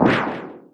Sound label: throw